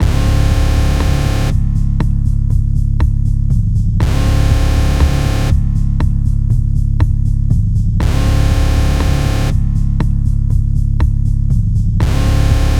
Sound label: Complex Cinematic Tension Sounds; Federico Soler Fernandez; Full Mix